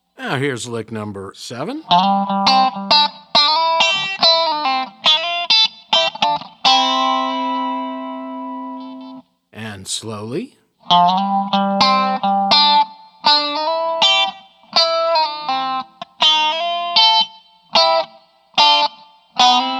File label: resonator pedal, steel guitar